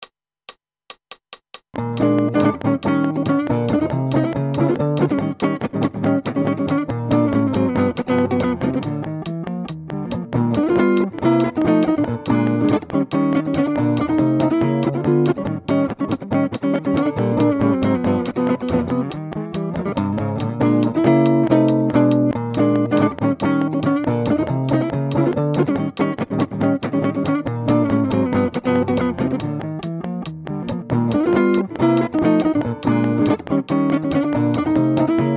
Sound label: Blues 280 Bb, Guitar